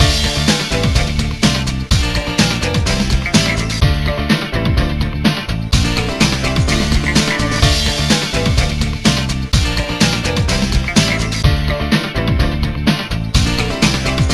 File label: BGM Mini Game; Ready Steady Cook The Game; NDS